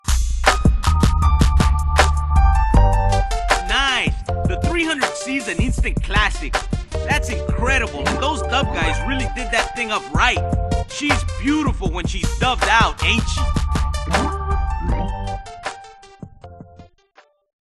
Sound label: Reward; Midnight Club 3 Dub Edition Remix; Xbox